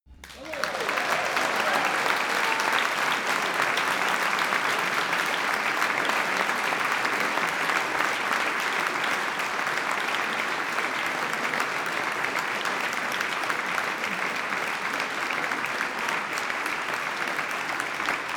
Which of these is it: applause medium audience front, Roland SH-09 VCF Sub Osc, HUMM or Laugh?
applause medium audience front